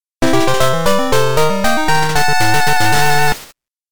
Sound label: Fanfare!; Pokemon OST Collection; Pokemon Gold Silver Crystal OST